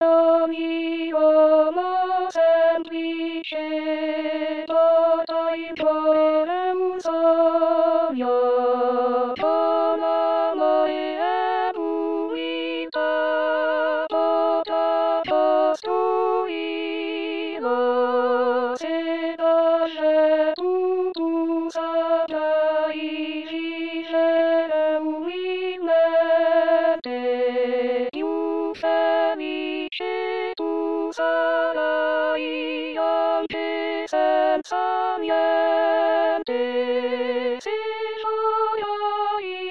Canzone di San Damiano Coro

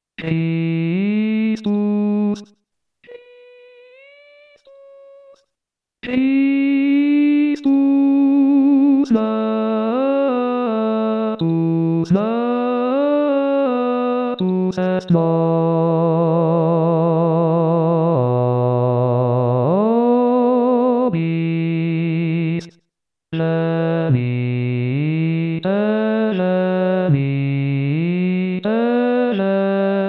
Bassi; Christus Natus Est; natale

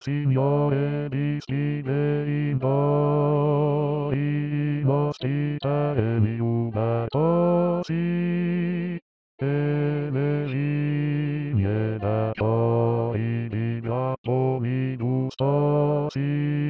Nel Nome Di Cristo Uniti Bassi

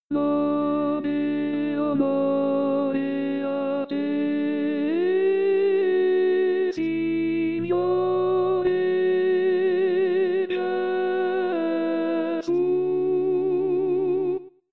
Contralti; Pasqua

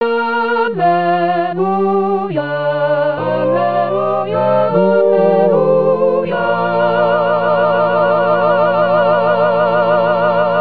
Canto Al Vangelo Coro

